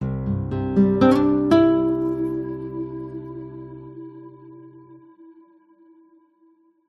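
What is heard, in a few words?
multimedia musical branding intro nylon acoustic guitar positive warm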